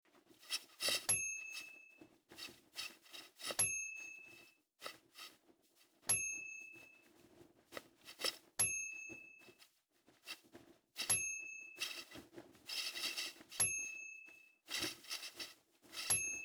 Espresso Steamer

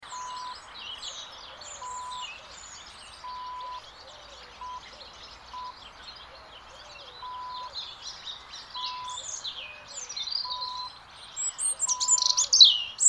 Country Winter Close Birds

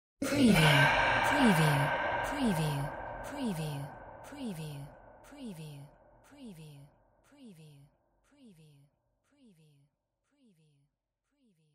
sci fi whispers spooky oowah; windy beach audio

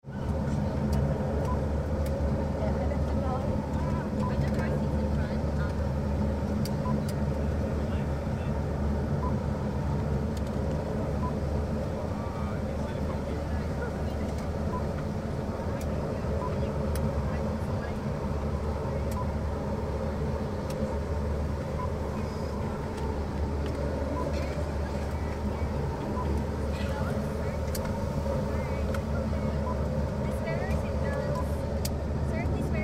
Boarding of Light Passanger Aircraft

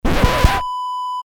record scratch

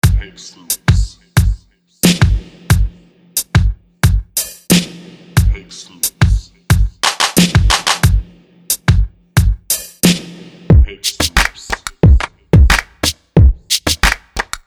sample beats

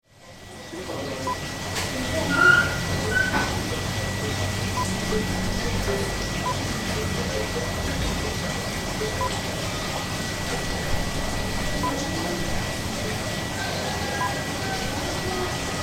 Bali Villa Ambience

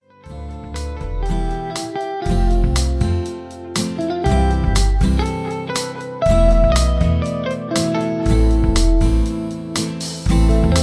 Guitar Solo

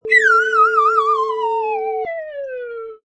Slide Whistle Funny Dive

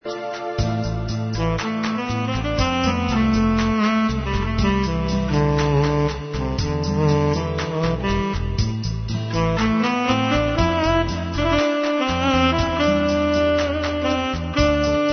Balladramatic

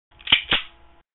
m1911a1

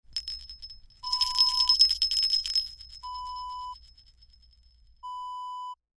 jingle bell

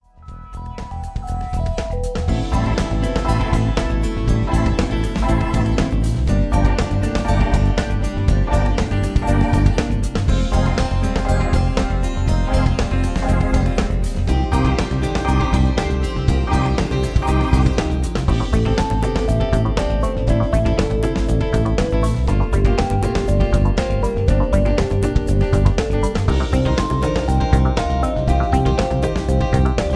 Ambiental Rock

